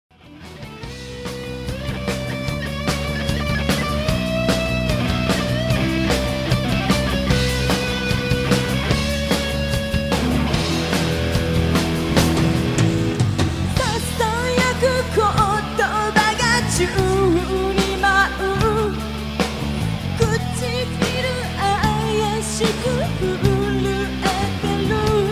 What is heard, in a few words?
kodoku music